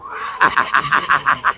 hahahaha

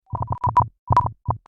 tripmine beep; subspace

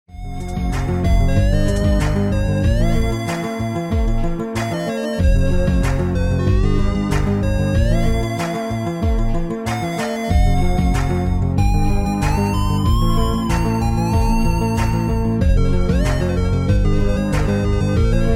calm music bits

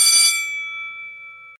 short school bell

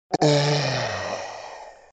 boss oooahaaah, boss pain; dark souls

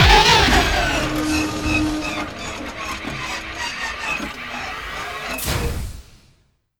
engine fail

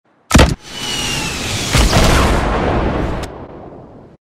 gunshot

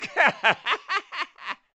laughing; npc

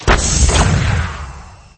grapple sound; lego star wars

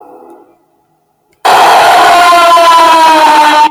loud

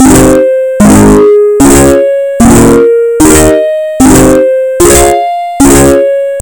bass boosted

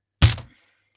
bonk

